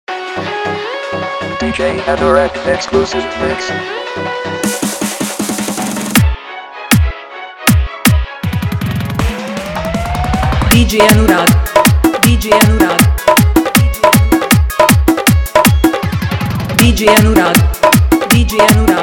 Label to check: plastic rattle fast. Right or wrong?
Wrong